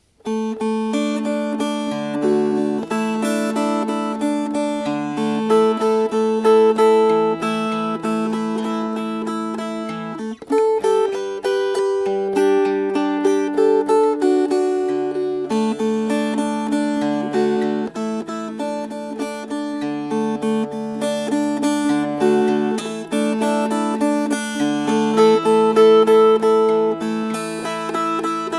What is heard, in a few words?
Fingerpicked